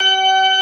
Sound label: B3 G#3, ORGAN; VOCAL ORGAN; ORGAN #2